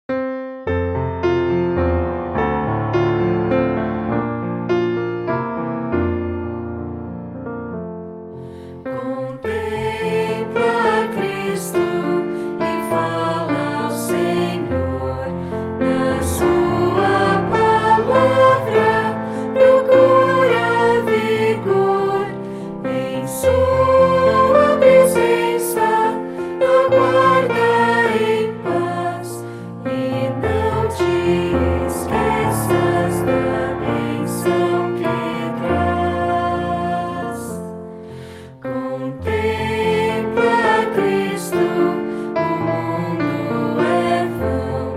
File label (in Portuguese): voz piano